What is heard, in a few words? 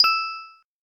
doremi zero, keytone